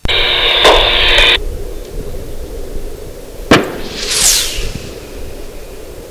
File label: 50 SHARPS 425GR 1100 YDS; sound of bullets